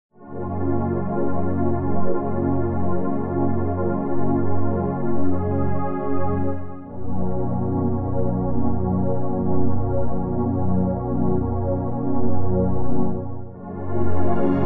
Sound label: Mellow Pad; Luminance v2